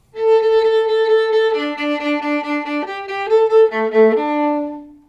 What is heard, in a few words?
Viola